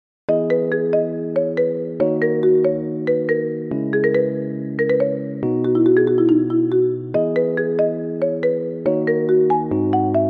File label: Newage; KIDS 귀여운